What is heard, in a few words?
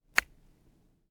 card; puzzle